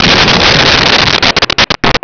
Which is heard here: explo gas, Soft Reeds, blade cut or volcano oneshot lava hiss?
explo gas